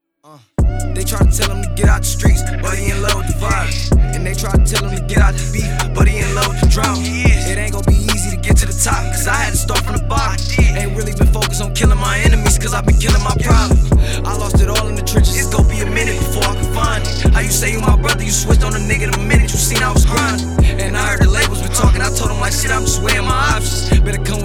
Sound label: HIP-HOP